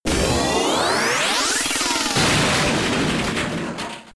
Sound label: Slide; creature from the black lagoon; bally; pinball